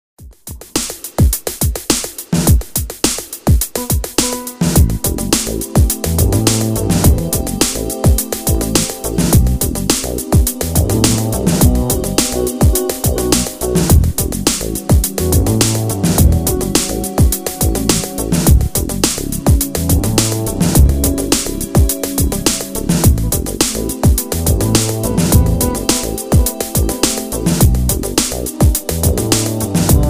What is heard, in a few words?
Sitar